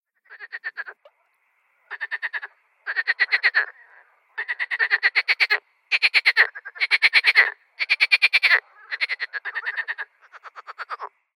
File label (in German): Seefrosch Balzrufe; Froschlurche; heimischen froschlurche